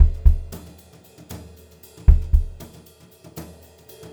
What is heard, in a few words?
HOTROD VARI, Brushed Drum Loops; USB Soundscan vol.39; AKAI